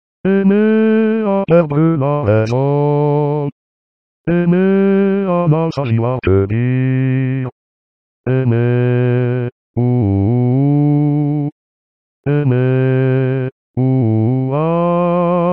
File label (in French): basse